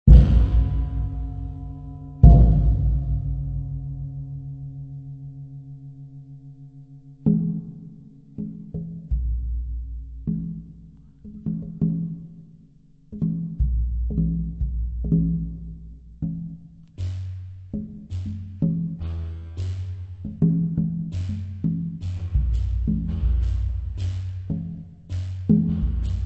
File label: swamp